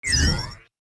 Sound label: chime